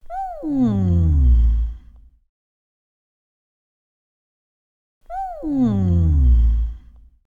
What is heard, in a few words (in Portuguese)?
engolindo